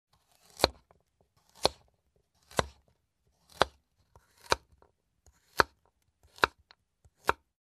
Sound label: Cutting on a wooden board